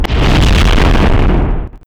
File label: nuke; SuperPower 2 Redux V7A